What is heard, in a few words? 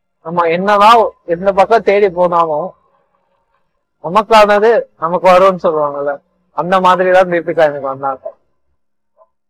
vocals